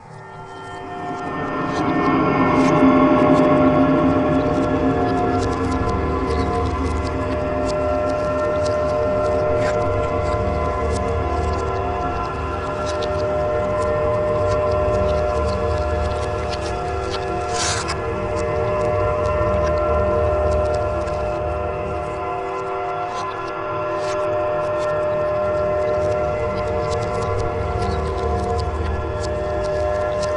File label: Atmos; neuro; InsideInfo